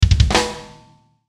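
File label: Musical Element, Drum Snare March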